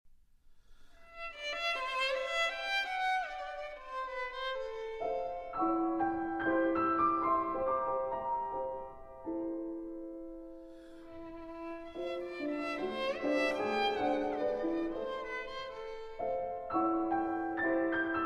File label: violin and piano; Sonate Posthume; M. 12